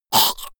monster choking high; koerpergeraeusche; comedy-cartoon; soundpool

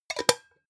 pot lid close plop; objekte; topf

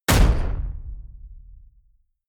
sci-fi space pirate gun fire bright; pistolen gewehre

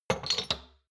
shot glasses place multiple soft; soundpool

